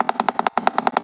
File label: cartoon rattle